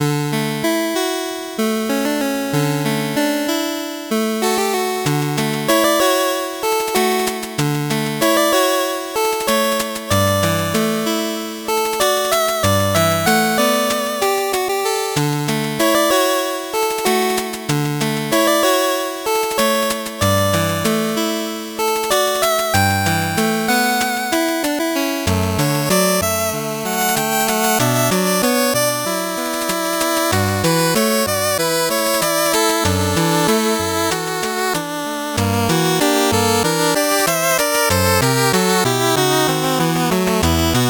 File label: 8bit bgm slow